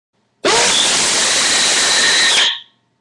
soft scream

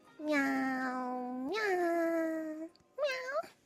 vtuber cat meow

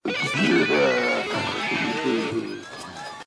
air solo guitar funny

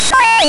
bip sorry

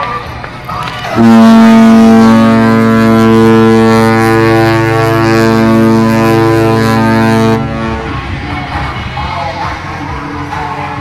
cruise ship horn

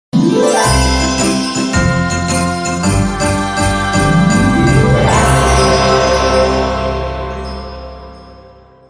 christmas song

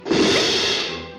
cartoon cymbal

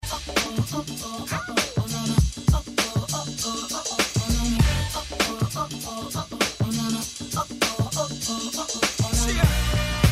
uh ohs

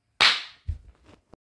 smack sound effect smacking

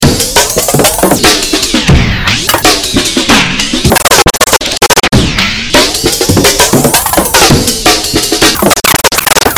creak; amen

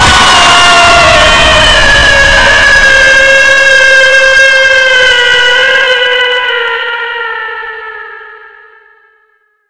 jumpscares; po minigames

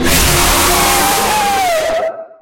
girl screamer

